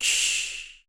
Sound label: cymbal, Roland MC-909